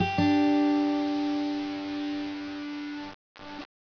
guitarra

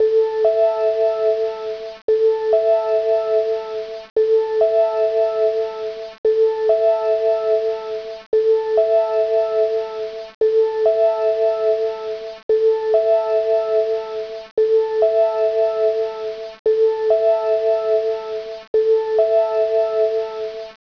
sint Loop

